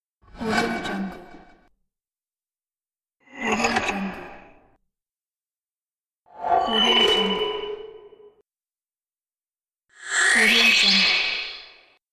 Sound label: comics universe transitions